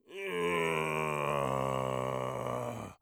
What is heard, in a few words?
poisoned, orc voice; Orc Voice Pack